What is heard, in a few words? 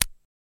Zippo Lighter Close